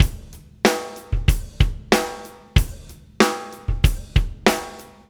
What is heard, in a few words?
Metal, Drums; ACID MUSIC